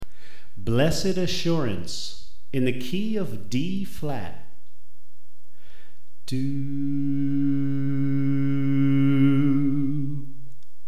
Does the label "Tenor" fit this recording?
Yes